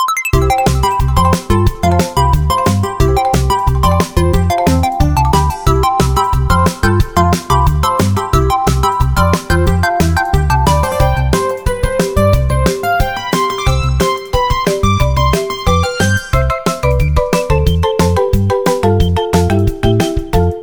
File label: Lively; Gionee; ELife-E7